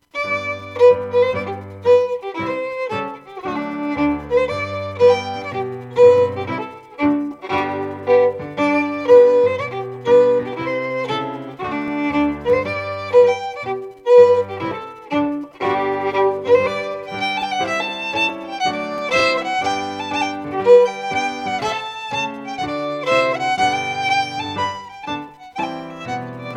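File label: Strathspey, Scottish Fiddling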